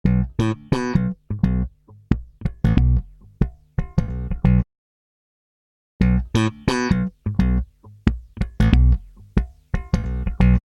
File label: Bass Slap